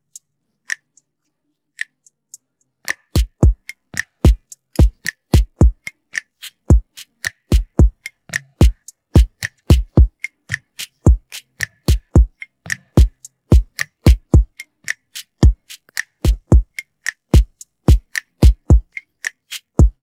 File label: Bossa Nova Drums